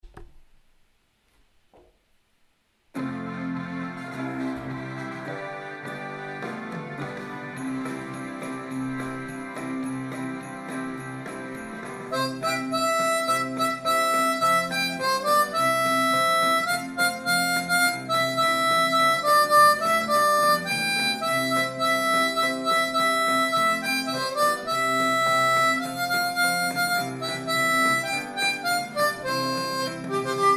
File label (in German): Jingle Bells C, Mundharmonika